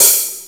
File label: OHH; Crypton - DrumBase5000